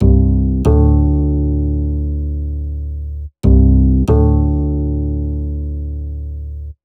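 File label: 070 Acid Jazz Doublebass D major; Pro Tools Essential Loops; Digidesign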